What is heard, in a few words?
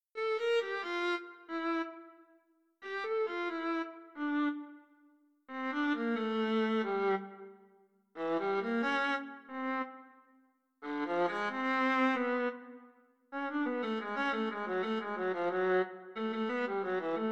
Sententia for Viola